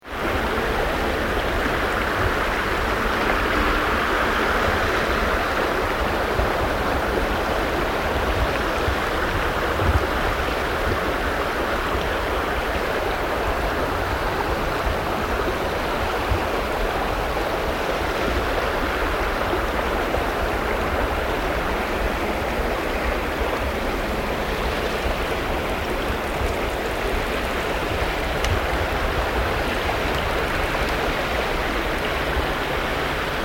River Fast Flowing, Over rocks - wide medium long shot; Nature; Rivers; Tom Hutchings